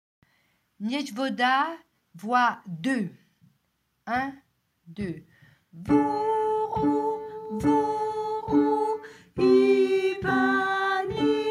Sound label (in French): voix